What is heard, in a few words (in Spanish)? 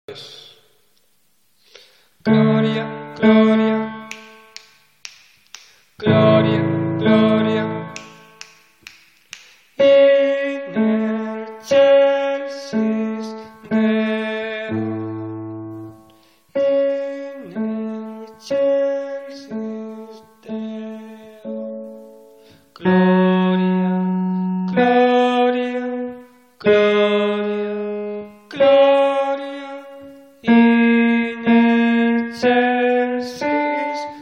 tenores